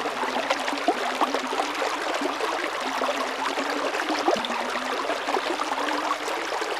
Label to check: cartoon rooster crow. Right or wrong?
Wrong